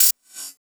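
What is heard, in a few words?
hi-hat L, Drum & Bass 160 BPM; Ueberschall; Drum & Bass Flash Trackz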